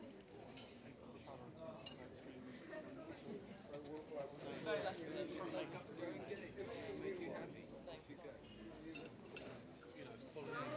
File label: music